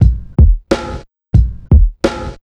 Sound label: DRMS, Underground Hip Hop